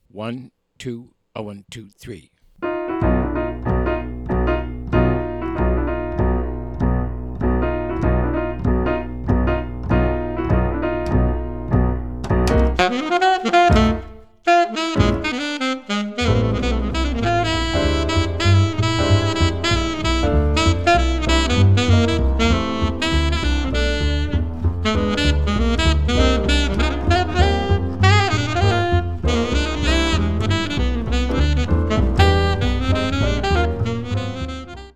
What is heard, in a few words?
minus Drums; sticks throughout; count off sets up the intro; play figures and comp for the intro and melody